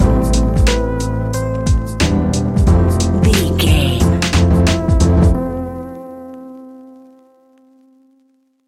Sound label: neon city nightlife stinger, A relaxed and chilling piece of music